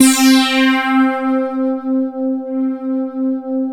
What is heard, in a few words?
TD C5, SYNTH; Q Up Arts; Dream Experience